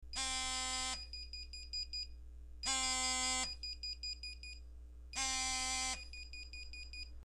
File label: cel alarm